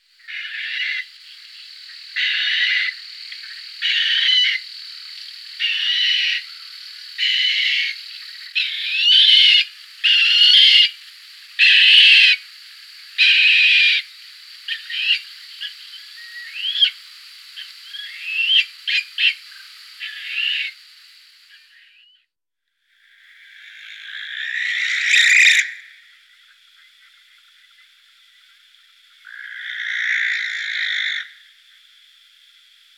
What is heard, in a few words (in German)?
Schleiereule